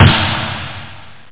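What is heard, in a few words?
snare drum; Aminet 21; GTI - Schatztruhe; Amiga 8-bit Sampled Voice; 8,363 sample rate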